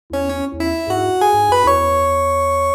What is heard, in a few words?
Level Complete; Aura of Worlds